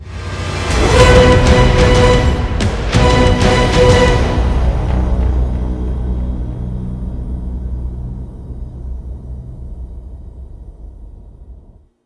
win bgm; svencoop; shockraid jungle

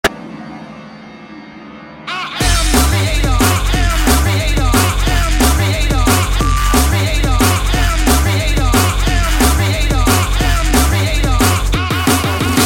win music

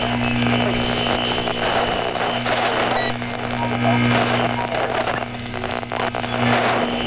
dead signal; svencoop

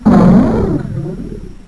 pit drone pain; svencoop; sectore

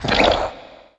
facehugger, IDLE; svencoop; aliens

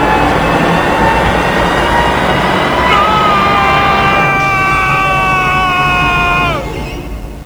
lift; svencoop

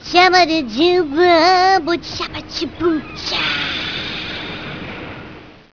shaboochaa; svencoop; sharknest

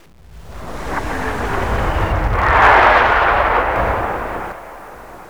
incom mortar, ambience; dod

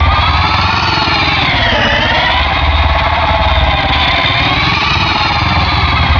flyer sick; gearbox; flier

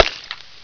glass

